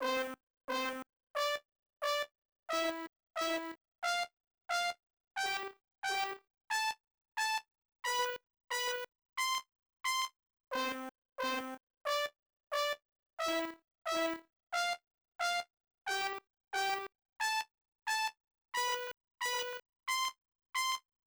Sound Scale Check; arcade; Run and Gun 2

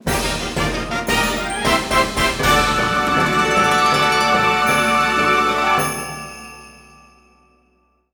Result Fanfale

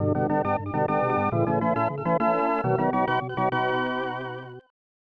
Fanfare ~ Classic; super bases loaded 3; snes

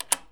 Un Lock Door

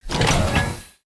wind turbine door

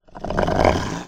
alerted, Wolf

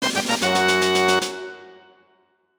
Weapon Upgrade; Donkey Kong 64

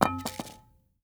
Arrow Fall Ground; Timelock VR

